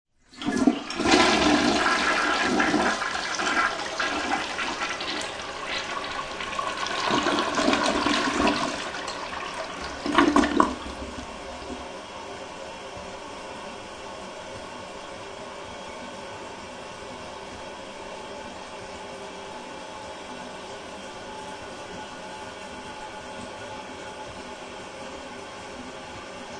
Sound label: Toilet Flush Run And Refill, Household, Toilet, Flush, Run And Refill; Bathroom